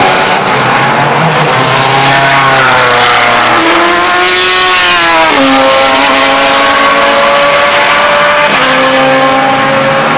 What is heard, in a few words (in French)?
son V8; Sebring 2011